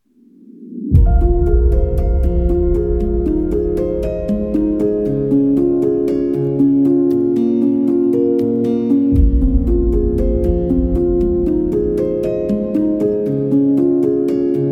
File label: majestic inspiring orchestra